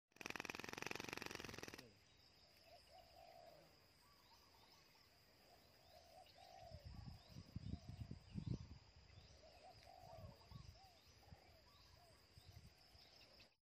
damselflies; سنجاقک

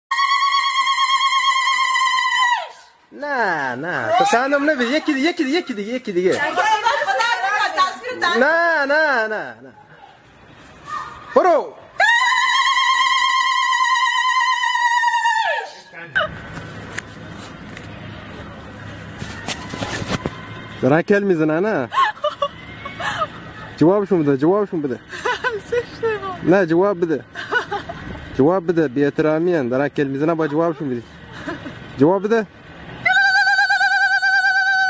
lol sound

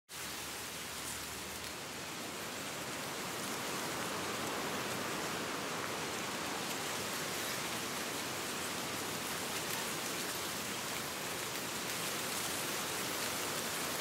autumn wind

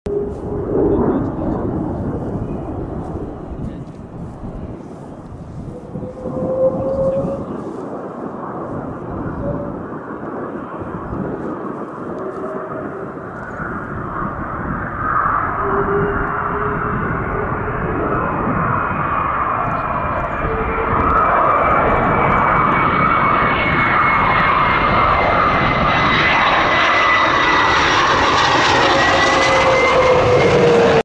F 22 Raptor; رپتور

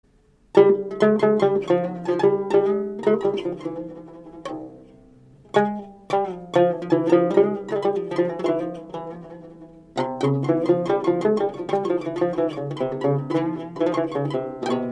Mirage, ساز مراژ

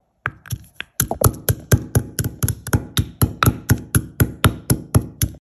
masher, گوشت کوب

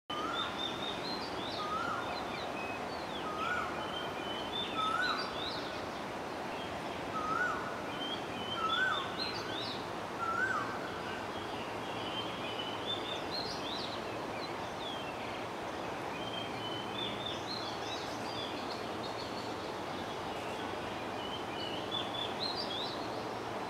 Eophona personata

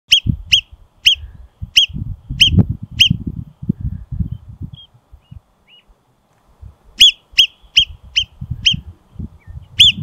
Marmot

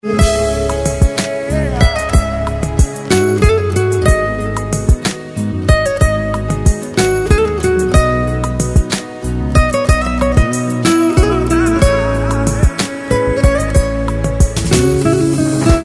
Honeymoon Ringtone

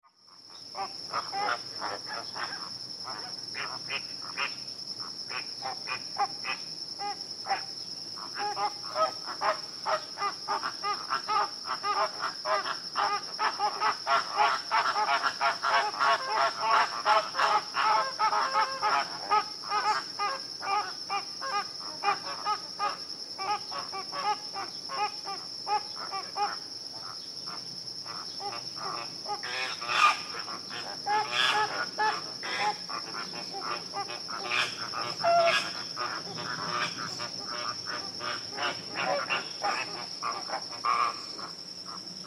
wetlands flamingoes

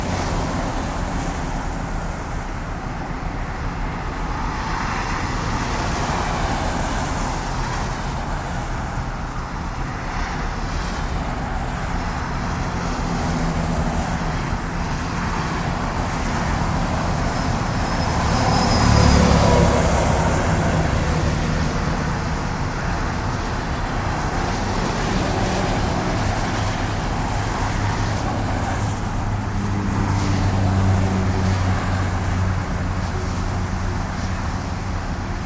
jamming sound

